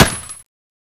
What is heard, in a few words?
PPS43